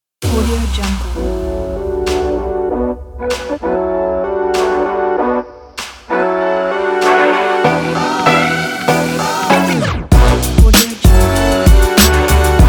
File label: that hiphop beat